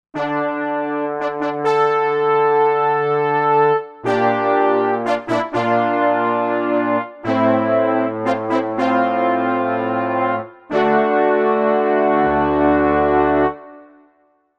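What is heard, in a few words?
Brass Classic Bras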